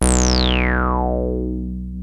SQU REZ 303, ROLAND TB-303 BASS; Rubber Chicken Software Sample Bank

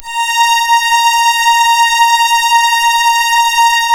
Synclav FM STRINGS A#; Big Fish Audio

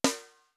DANCE SNARE, HR-16 DRUMS; Greytsounds

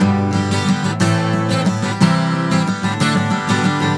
GTR, Celtic Flavours; USB Soundscan vol.42; AKAI

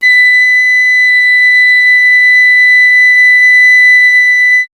FLT PIC, Piccolo; Miroslav Vitous - Solo Instruments VOL-2

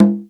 CONGA LOW; East West - Global Sounds; SC55 DRUMS

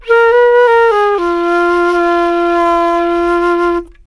flute, D brass licks

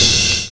TOM FILL

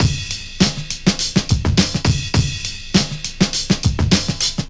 Loop, Roland; Sound & Vision - Gigapack I

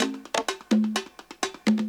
Dance Grooves

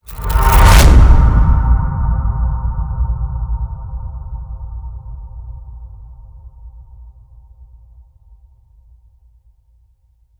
impact survival; Virtual Riot

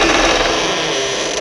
Door Creak, Roland BK-5

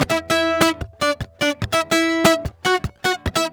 MERENGUE, World Grooves

